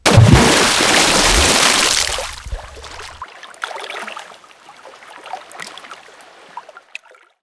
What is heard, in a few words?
plop; Mafie 1